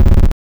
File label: rock door open